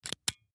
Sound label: Squirt Gun, Foley Squirt Gun Pull Trigger Empty Toy; Toys; Blastwave